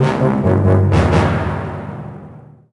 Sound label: Sting Combat Runaway